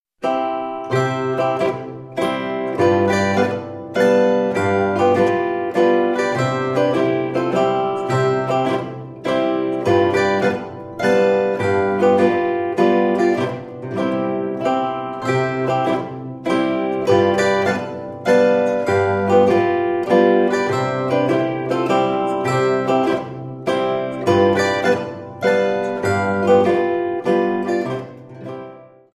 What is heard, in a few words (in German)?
Mazurka